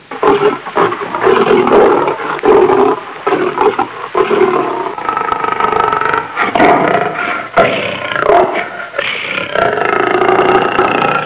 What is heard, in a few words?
Jungle leopard snarling